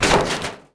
impact crate varied; impacts explos

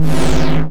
sweep; WhiteOut

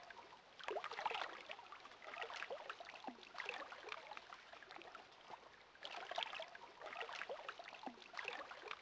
water lapping ambience; Star Wars Obi Wan; XBOX

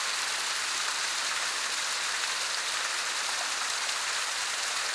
Fountain; ambience; Project Gotham Racing 2

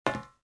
metal step, footsteps

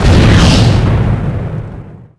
Small Exp, Explosions

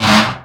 No; Totaled; XBOX

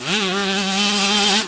high, Engine

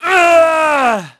DEATH; PHANTOM; Pulse Racer

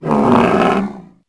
Call, Dino; Toro

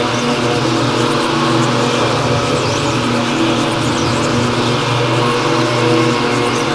cloud lp, ambience; jedi; Star Wars Jedi Knight II Jedi Outcast; XBOX

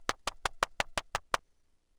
slap; lasvegas

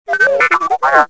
talk, r2d2

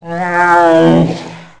Death Fall Back, Ice Beast

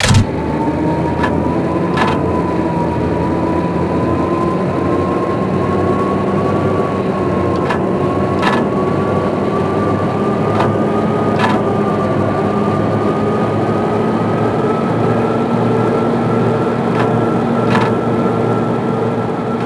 Rail starts; Max Payne; subway

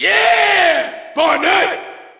Shout, audience; UFC Tapout 2

